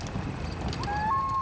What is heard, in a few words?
loon